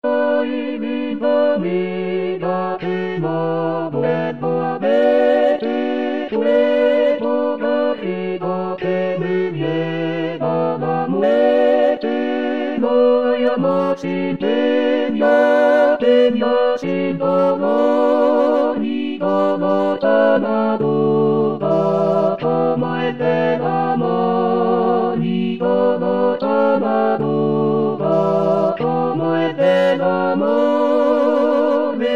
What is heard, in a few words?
Tutti; Ay Linda Amiga